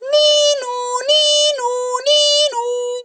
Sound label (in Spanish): ambulancia; El juego del confinamiento